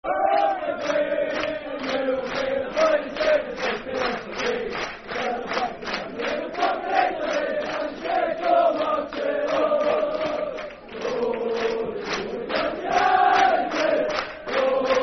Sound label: Football Chant; Glory Glory Dundee United; Dundee United Dufc